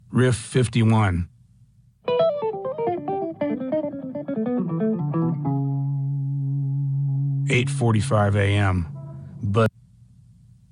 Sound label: Riff